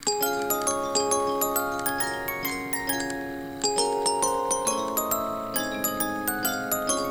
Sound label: Boxy Jingle